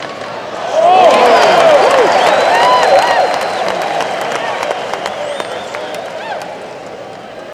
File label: Crowd Cheers Whoas